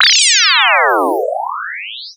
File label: Sound FX Flyby Hi Tech